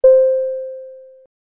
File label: Elevator Ding